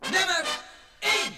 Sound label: jinglepackage; topformat LP jinglepackage 1